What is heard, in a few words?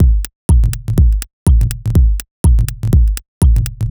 A# 123bpm, House Drumloops; Digitalia; Zero-G House Fabrik Drumloops